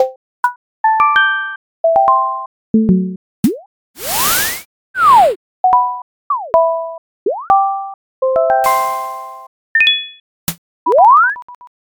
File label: ui sound effects; creator ui sound effects pack lite